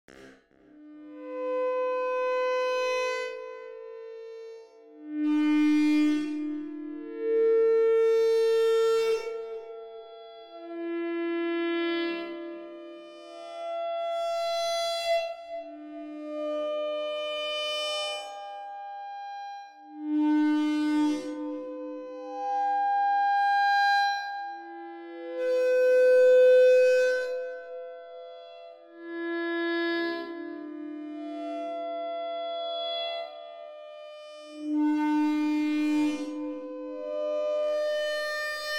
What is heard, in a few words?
Song; Sphere